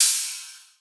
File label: Ride; Cymbals; Ghosthack; Color Bass Essentials